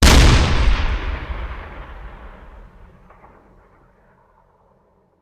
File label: Cannon Tank; Sound Ideas; Guns